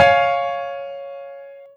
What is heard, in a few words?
Three C# F G#5; osu